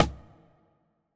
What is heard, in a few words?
Strike Imp